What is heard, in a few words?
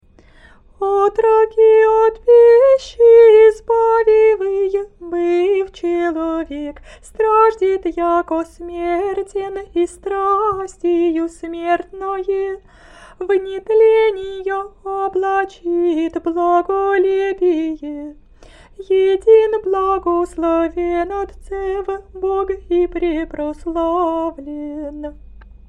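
easter canon byzantine hirmos faster, singing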